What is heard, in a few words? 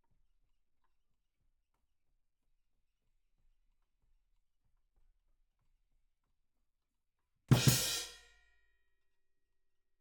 Ride